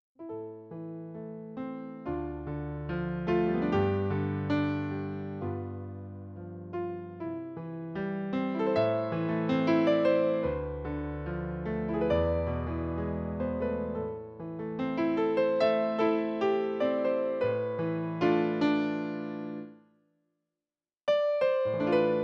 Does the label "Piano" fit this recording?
Yes